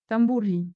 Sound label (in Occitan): tamborin